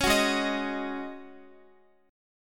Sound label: Ab 7th Suspended 4th, Guitar; strummed; x,x,11,11,8,10; down; 4E,4A,5D,5G,5C,6F